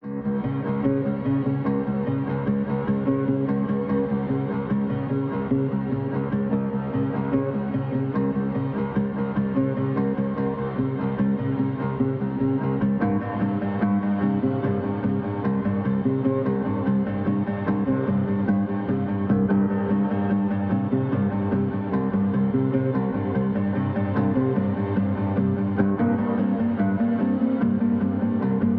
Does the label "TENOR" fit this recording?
No